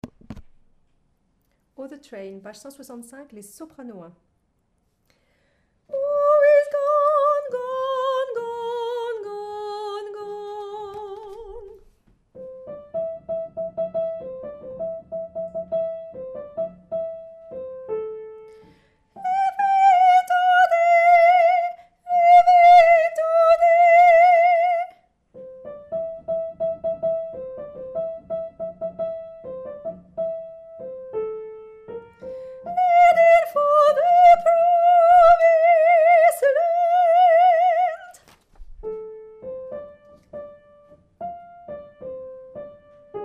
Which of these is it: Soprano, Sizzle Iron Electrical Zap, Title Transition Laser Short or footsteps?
Soprano